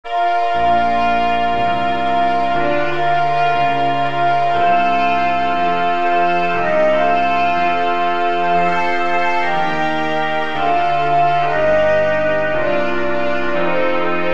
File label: sans tenor sax